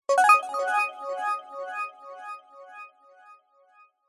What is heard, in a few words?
multimedia game sound nature game positive